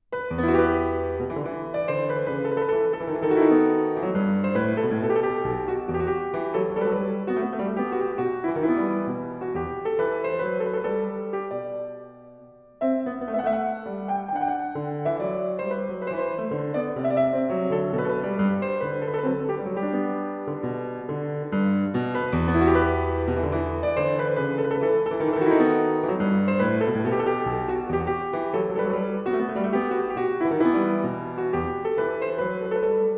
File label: Courante, Keyboard Suites; Rameau